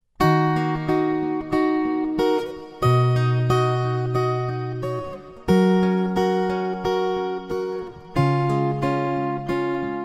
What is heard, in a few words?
guitar ringtone